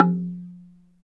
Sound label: Slit Drums, Africa, Wood & Metal; Roland LCDP11 Africa VOL-1